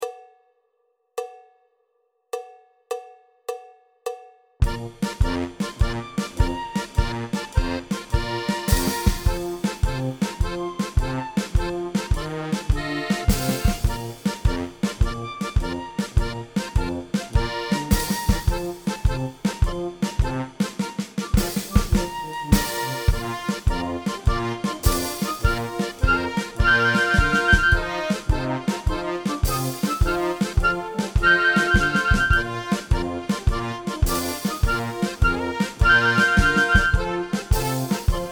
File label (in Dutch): Solo